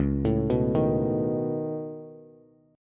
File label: Db 7th Suspended 2nd Sharp 5th Db, down, Bass Standard; arpeggiated; JGuitar